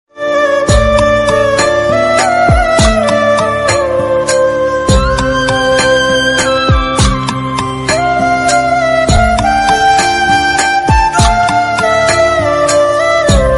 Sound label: nav durga bgm devotional instrumental ringtone, durga puja ringtone